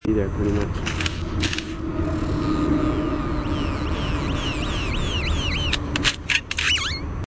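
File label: Great-tailed Grackle song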